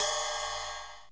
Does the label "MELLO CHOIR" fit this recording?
No